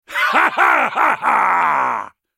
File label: laugh; Olaf